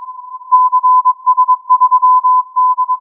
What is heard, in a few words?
filtered input